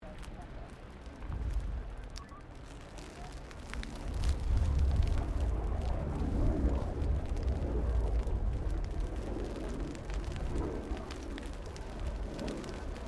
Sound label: Backburning Firetruck Helicopter, Arial firefighting, small bushfire; Emergency Vehicles